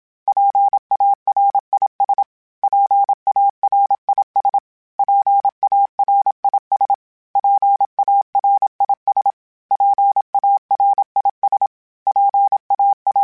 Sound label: PARIS, Morse Code; HamRadio